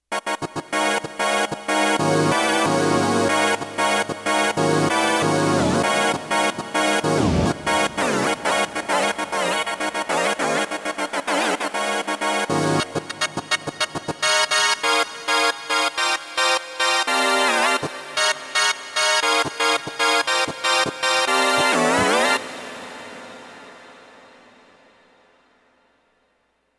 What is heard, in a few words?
Dance Choir, Roland VC-1